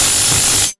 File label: dash